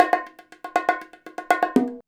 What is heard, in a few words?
119 bongo; Soundscan vol.56; Modern Percussion Loops; AKAI